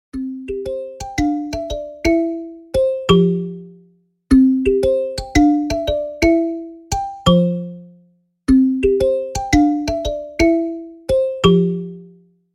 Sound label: speaker test